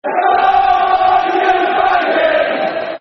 Come On You Baggies, Football Chants; West Bromwich Albion Wba; fanchants

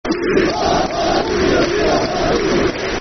Football Chant (Soccer Songs); We Are Hartlepool; fanchants; Hartlepool United Hufc

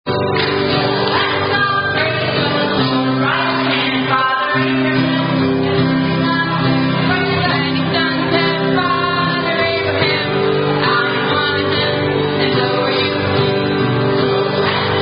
Father Abraham Dance

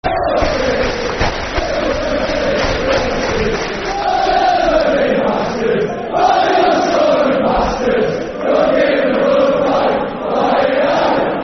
Forever And Ever, Football Chants (Soccer Songs); Sheffield Wednesday Swfc

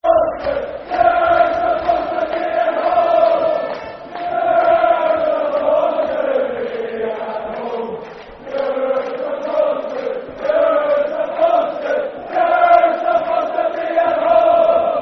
Football Chant (Soccer Song) N. Ireland; You're Supposed To Be At Home; N.i.f.a

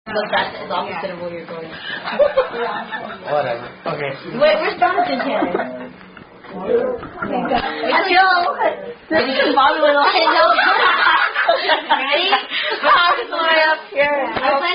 Table Thumping Game; Thumper Part 2; Unknown Table Thumping Game

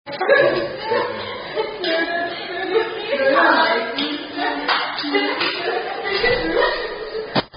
German Attack Song

